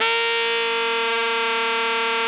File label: ALTO GR A#3, Saxophones; Greytsounds; Philip Wolfe David Crozier's Saxophones